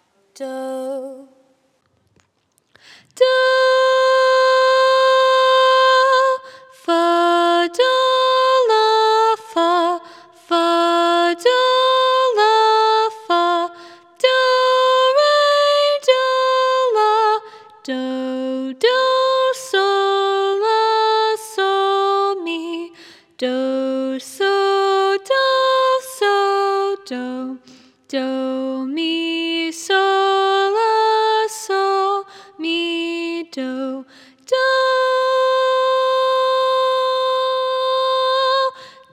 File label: Intonation in C top starting note